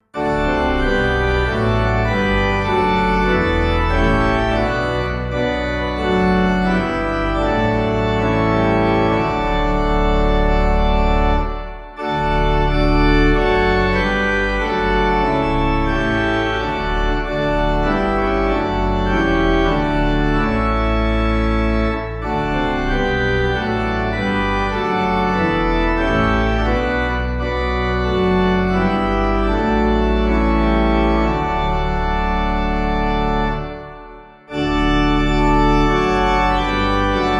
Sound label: Pipe; In Christ There Is No East